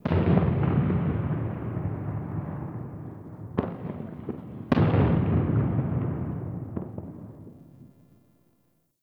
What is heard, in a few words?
Ambient Distant Explosions; Nineteen Ninety Nine